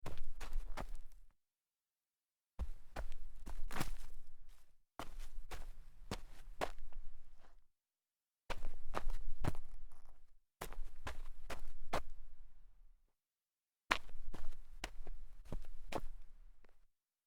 sneaker sidesteps dirt, foley

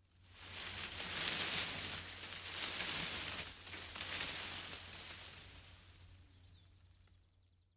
branch and leaves

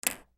pen clicking

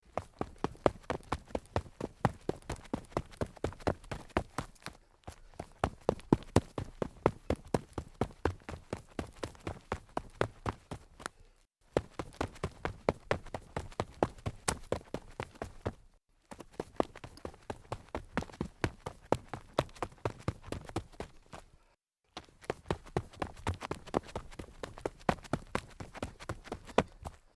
sneakers run dirt, foley

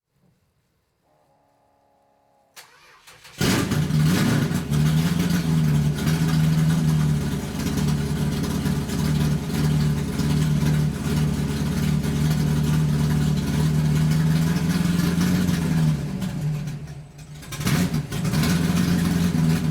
motor running loudly

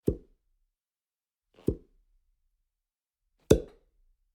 suction cup pull

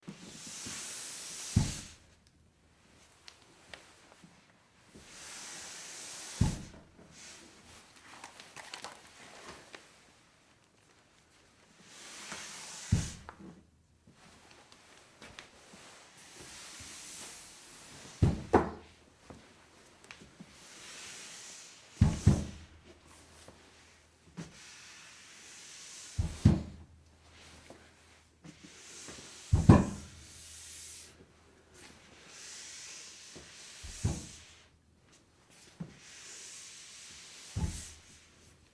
slide, foley

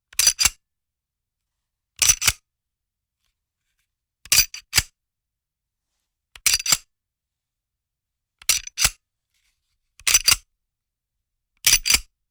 gun slide handling loud

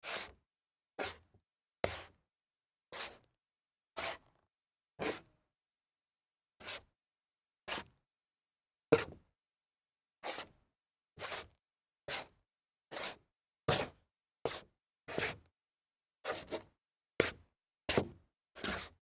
dress shoe sidestep on wood

